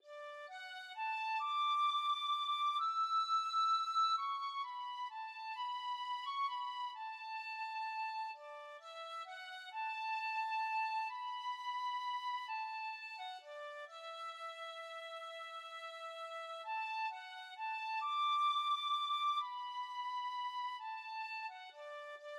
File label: tin whistle